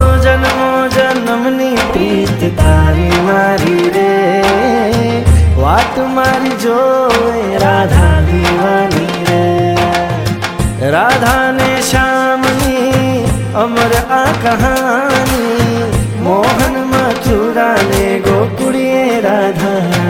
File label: radha krishna gujarati ringtone; A romantic devotional melody based on Radha Krishna bhajan music.